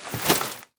sfx equip; Blind Gladiator

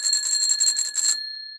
bike bell, street traffic; best service Blue Box

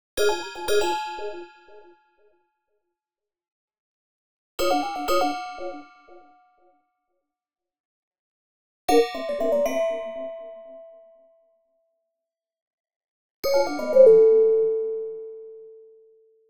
Arcade Jingle Negative; boom library; The Interface

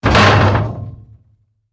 wall cones hit; firefight

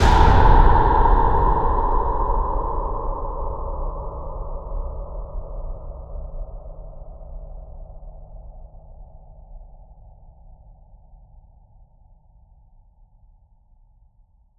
STING, Cinematic Metal Impacts; boom library

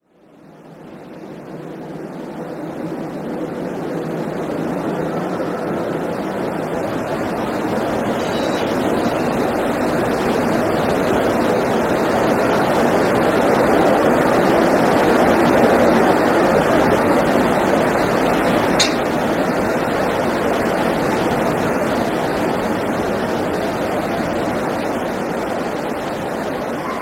stereo street; Pitch Black - A Detective's Demise

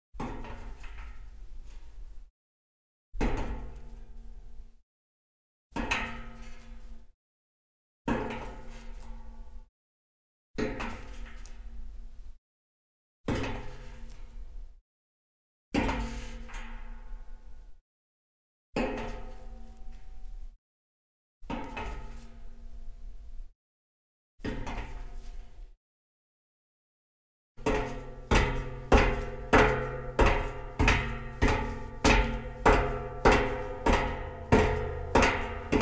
Metal Grate Undampened, Foley Footsteps; Sound Ideas

